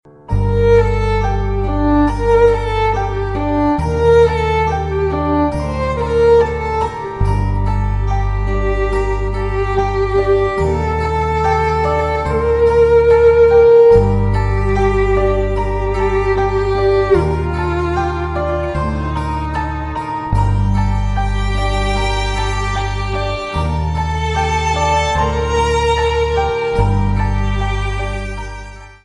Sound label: violin piano ringtone; requiem for a dream